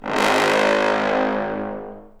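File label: BRS, Bass Trombone Section; Roland L-CDX-03 Disk 2; Bs.Trombones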